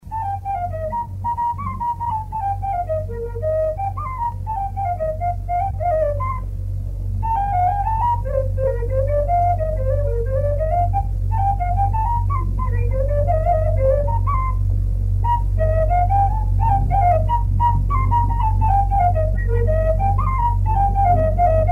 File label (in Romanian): Drumul la Galati la fluier; jocuri si cantece batranesti; Vaslui